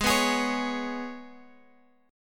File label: G sharp Minor Double Flat 5th G sharp, Guitar Open F; G#mbb5 strummed; 20,18,20,20,x,x